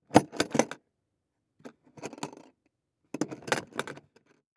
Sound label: Industry Tool Box Open Lid